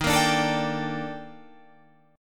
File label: D sharp Major Sharp 11th; x,18,20,19,21,21,21,x; chordshape